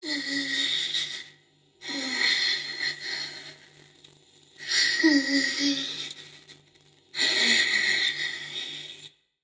Breath; Mita; MiSide

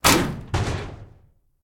Door Basement Open Shut; Game rip; MiSide

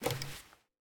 Clock Take; Game rip; MiSide

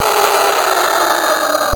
Screamer; raw game audio files; Slouch; MiSide

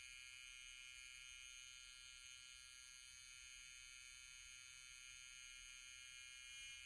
Lamp Noise; MiSide

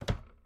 Close Tumb; Game rip; MiSide

